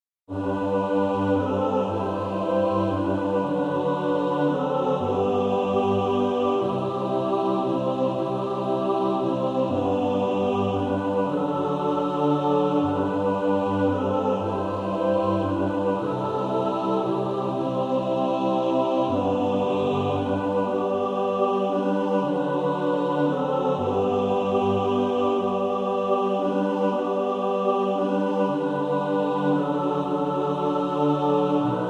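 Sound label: mixed, practice music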